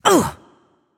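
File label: ouch, female voice; smite; voicepack; hera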